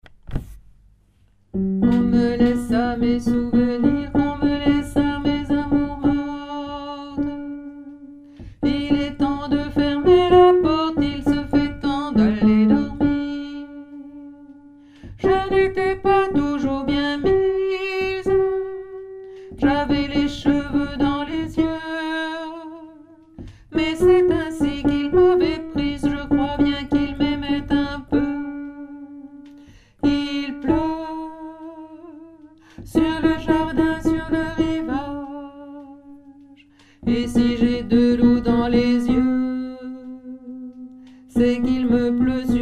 mezzo; choeur

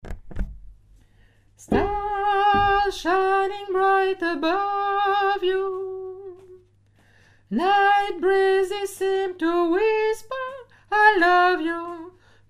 soprano, choeur femmes

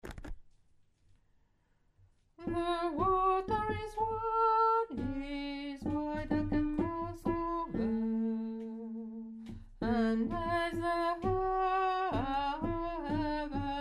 the water is wide basse

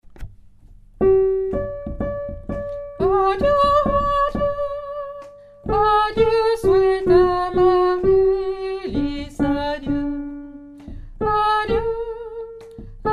sweet amarillis alto; repetition agen septembre 2024

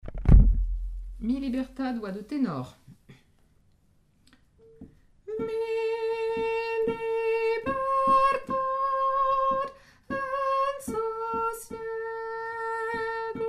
mi libertad tenor; repetition agen septembre 2024

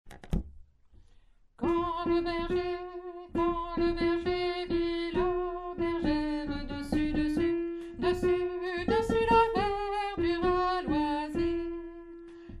alto, chorale

